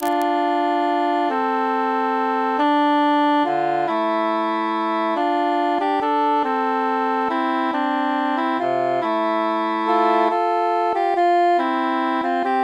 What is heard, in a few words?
treble mute; we be souldiers three